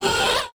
Monster Hurt; A Robot Named Fight

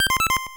Stereo Pause; power blade ost 1991 nes

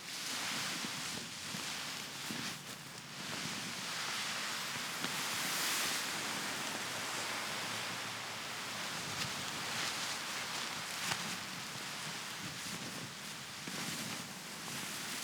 Drag Body

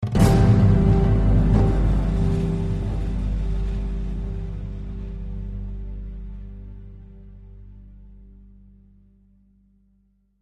Shock Stinger

